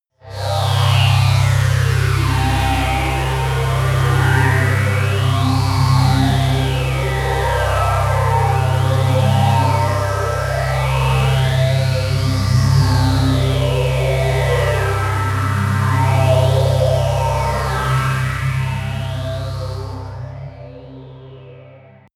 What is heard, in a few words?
pad sweep robot; flaechen